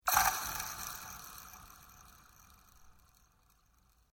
Toy Reverb Spring Bash, Strange Toys; Miscellaneous Toy; Foundation4

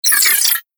Scifi Activate Device, control panel; Sci-Fi Sound Effect

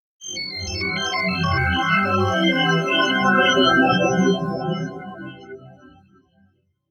Scifi Audio Logo Computer Patterns Data

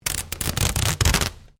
Sticky Tape Rip Pull; common tape; Offices

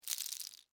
Debris Penne Rigate; Texture; Soft; Debris Sound Effects; Materials

